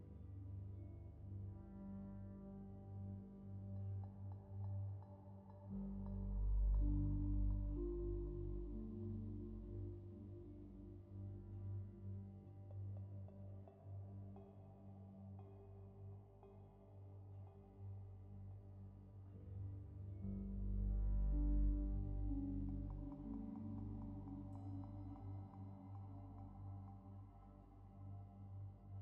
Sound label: Lobby Finale Loop